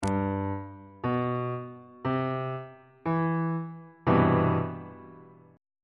c major 7th 2nd inversion on bass clef

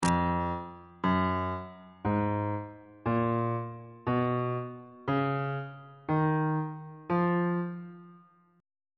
e sharp locrian mode on bass clef

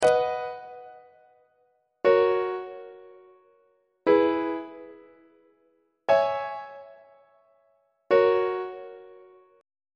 b major half cadence on treble clef

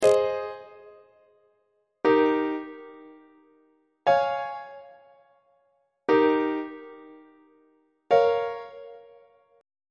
g sharp major deceptive cadence on treble clef

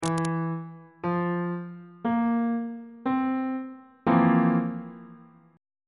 f major 7th suspended 4th 3rd inversion

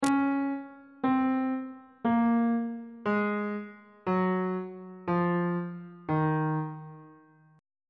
d sharp dorian mode descending on bass clef

